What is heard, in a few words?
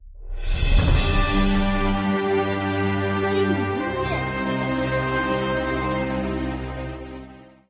battle lose; 动作休闲手机游戏; 丢丢大冒险; 魔幻大陆